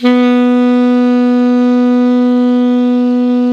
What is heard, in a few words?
alto pp B 2, Sax